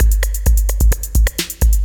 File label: groove; Computer Buyer 1997 April